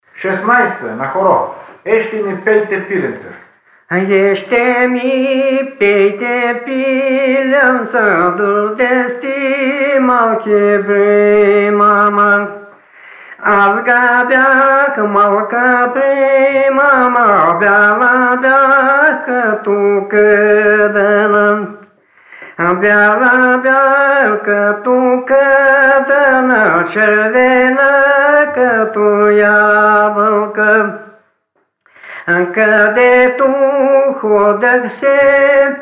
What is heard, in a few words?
folk